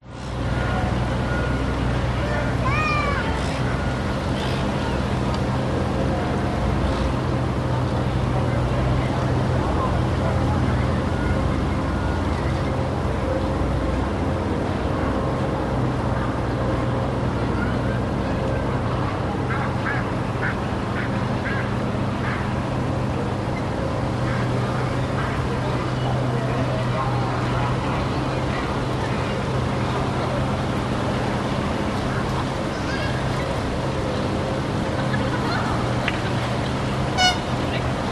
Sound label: public places park botanic gardens birds few people wind trees; bird watcher photos distant